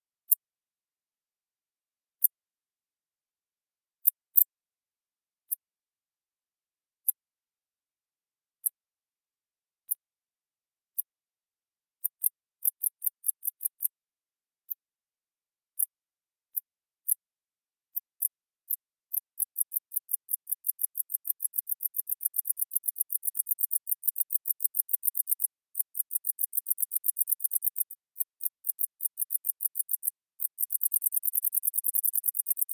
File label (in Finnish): niittyhepokatti